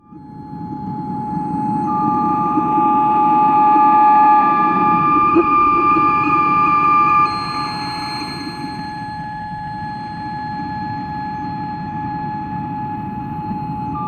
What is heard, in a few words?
sound design texture alien signal; sound effects one